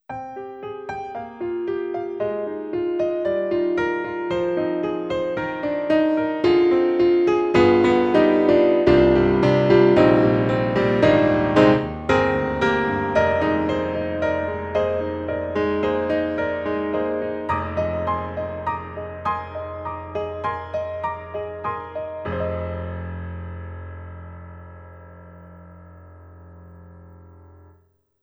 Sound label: German Concert D; Synthogy; Ivory1